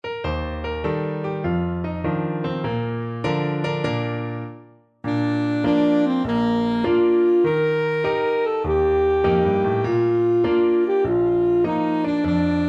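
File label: alto saxophone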